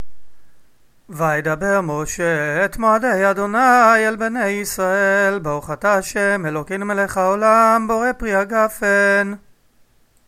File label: kiddush day, Special tune for the Kiddush; kiddush 3 regalim; חגים